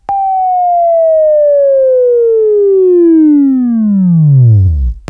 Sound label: WAV Left, Sweeping